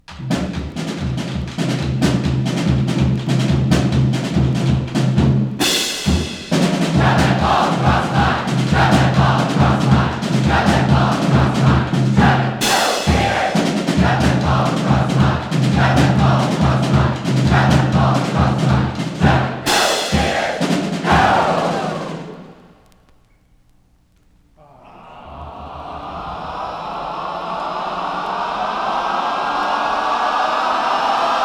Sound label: Booyah Cheers; 1969; Shove That Ball